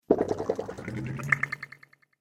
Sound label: Water Space Bubbles, sci fi; Water Sound Effect